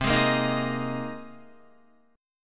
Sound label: C Minor 7th Sharp 5th C Guitar; Cm7#5 strummed; JGuitar